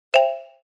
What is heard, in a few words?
upgrade result win